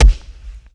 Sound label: Mega Body Punch, Hand To Hand Combat; Sony Pictures Sound Effects Series